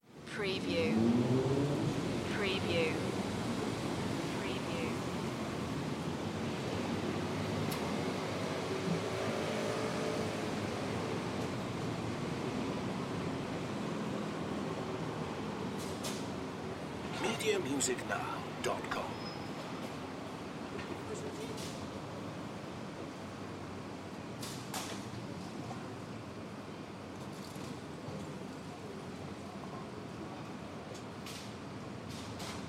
France City Street; sound-effects-bible

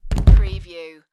Body Fall Wood Floor; sound-effects-bible

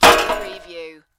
Metal Pipe Impact Junk Crash

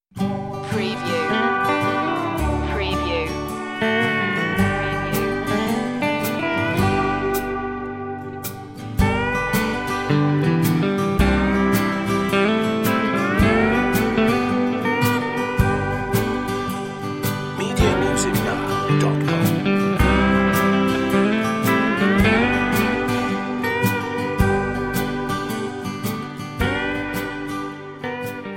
country

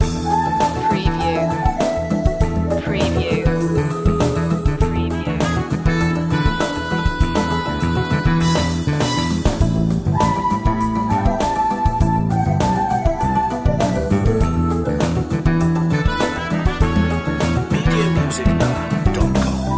fusion break loop

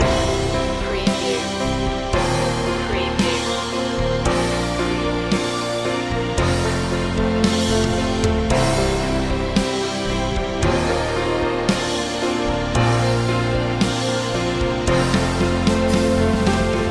danse loop

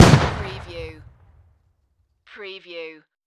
Dynamite Explosion; Sound Effects Bible

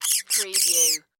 Monster Small Furry; sound-effects-bible

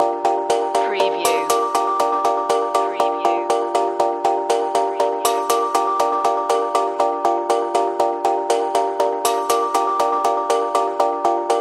interference loop

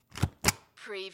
rubber stamp once

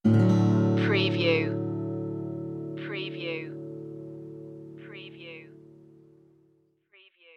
starry western night stinger

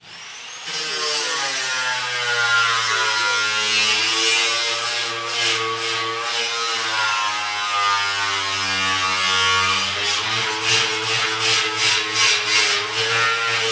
Disc Sander On Metal

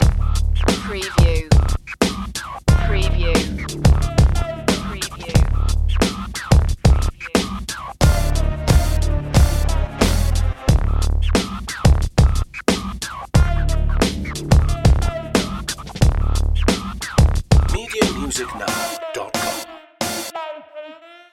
walk da raptor loop

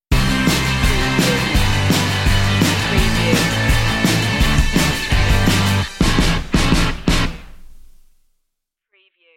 indie party stinger

